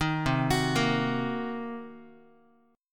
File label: C Minor 7th Flat 5th C down Guitar; arpeggiated; 4A,4E,5A,5D,5G#,6C#; JGuitar